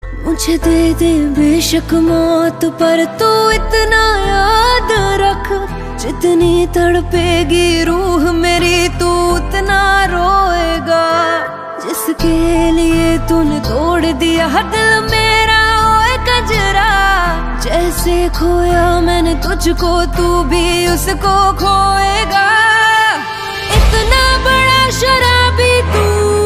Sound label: Ringtone